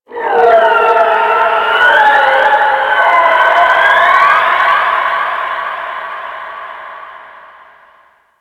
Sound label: Ocean Dolphin; Warframe; Grineer Ocean